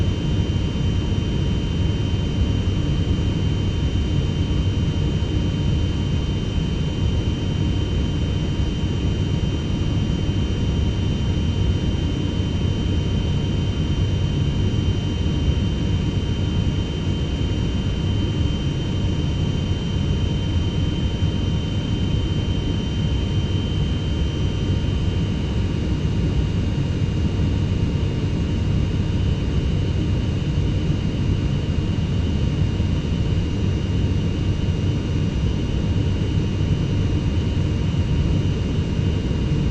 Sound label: airplane interior; transportation